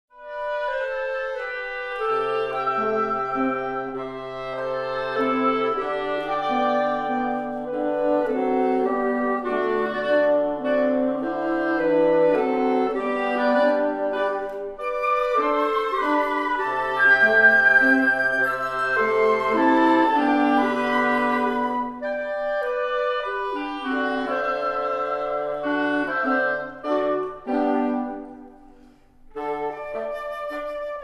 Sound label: Waltz, quintet